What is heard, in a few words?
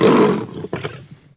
Lion Die Vox; Civ4 warlords